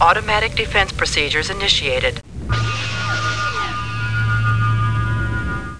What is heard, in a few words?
lockup; Monster Media Number 15; os2; warp